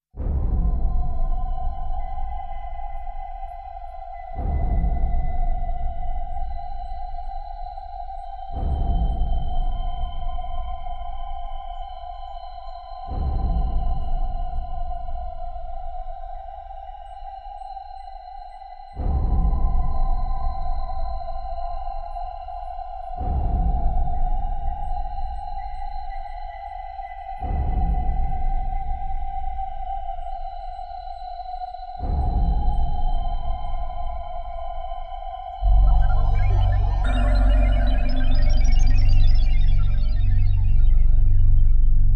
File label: Particles Drone